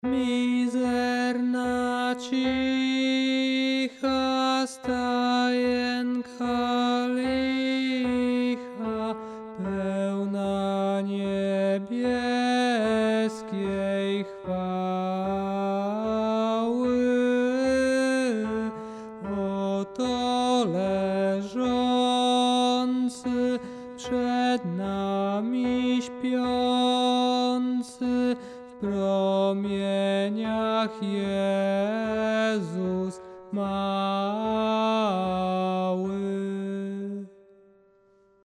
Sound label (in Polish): tenor; Mizerna cicha